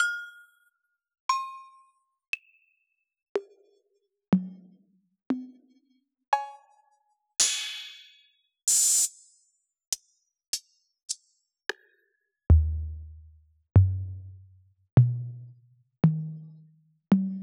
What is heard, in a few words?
Yamaha DJX 146 Anlg Kit